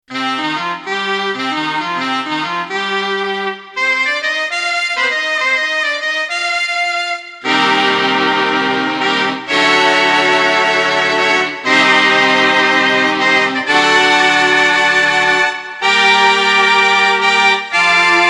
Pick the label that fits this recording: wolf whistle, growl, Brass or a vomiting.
Brass